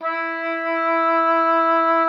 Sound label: E4, OBOE; Xsample VOL-6